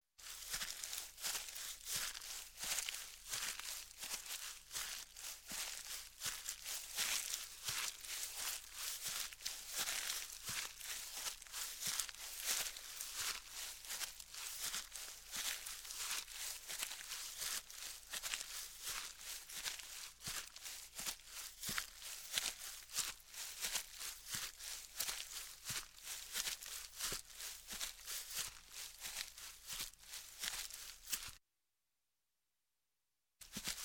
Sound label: Footsteps, Leaves; Sound Ideas 2000 Series